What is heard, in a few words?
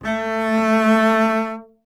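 string contrabass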